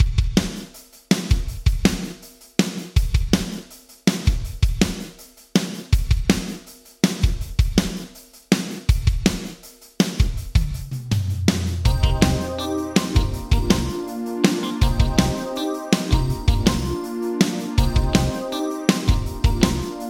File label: Drums Yamaha ProR3 Power Gate 1 Random; Vintage Digital